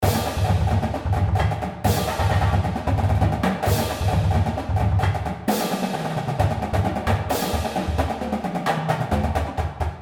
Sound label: medley with perc; halftime shows; marchingband